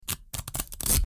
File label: Potato peeling